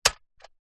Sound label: science fiction robot android single footstep wood